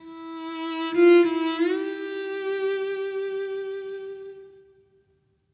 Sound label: Music Level Argument Stinger; Krish